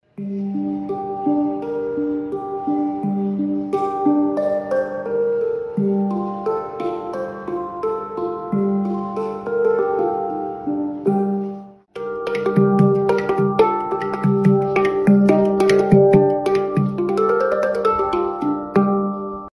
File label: mini golden handpan 9 notes g minor